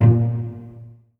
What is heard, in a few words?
A#1, Virtuoso Strings; ILIO; Kirk Hunter Virtuoso Strings VOL-2; 10CL TRM FX